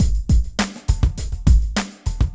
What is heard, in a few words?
funky 102, drums; Loopasonic